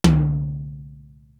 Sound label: HEAD TOM; East West; Global Sounds